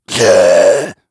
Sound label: slash; cstrike